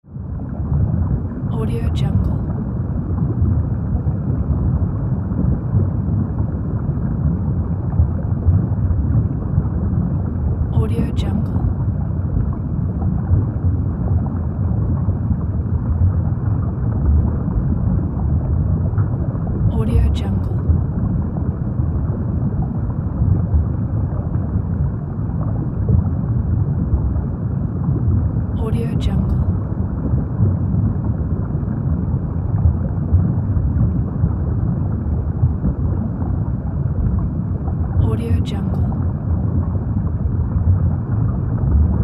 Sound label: underwater